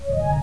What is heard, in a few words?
Shields; CheeseToast